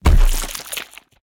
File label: body hit finisher